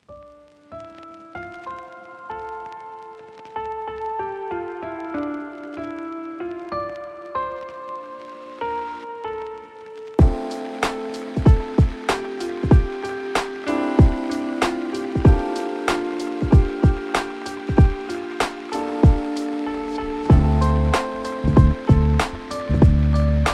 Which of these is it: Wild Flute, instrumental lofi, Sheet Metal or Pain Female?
instrumental lofi